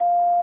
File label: speech beep